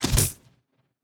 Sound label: Spiters